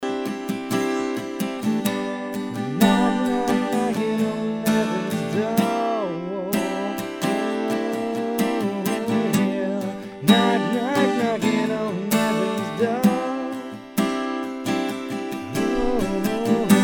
acc GTR; 451-xy; X-Y stereo

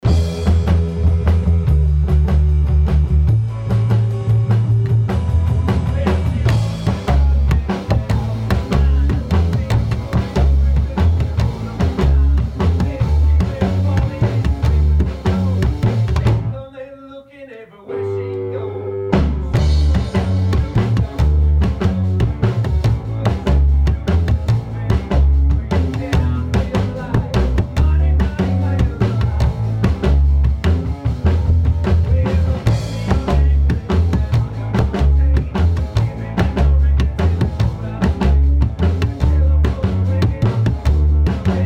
Upright Bass Bounce, Listen to the Bass Mic; Fort Elmer

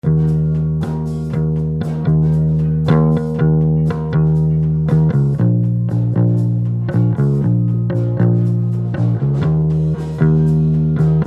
bass EQ in out

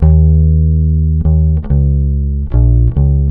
DI bass; Listening Test-3: Bass

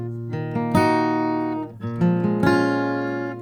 Fathead on bridge, Accoustic Guitar; Listening Test-2: Accoustic Guitar; Fathead Blumlein

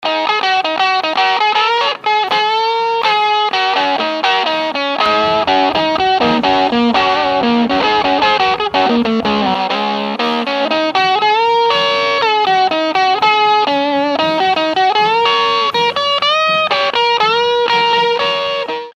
guitar EQ in out

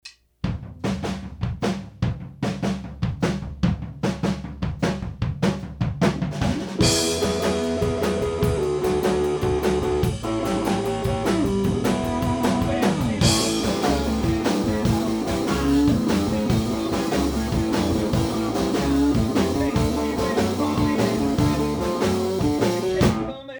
Room, Royer Ribbons in Blumlein

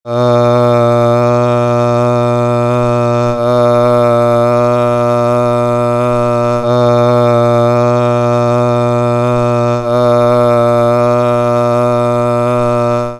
mezzo; SM-69 and partially modified Apex-460 (Cinemag xfrmr), AH Loop test; Neuman; EQ out

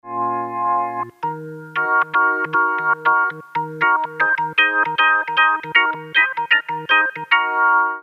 organ DI

